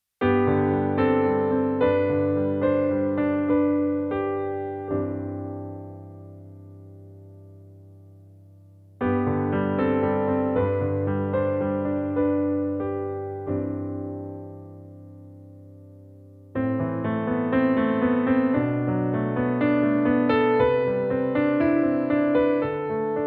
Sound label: piano sample